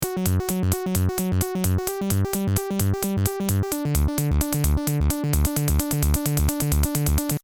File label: arpeggio rock; Loop Mania Sampler Pack